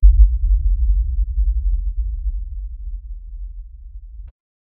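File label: absolute cinematic silence; thriller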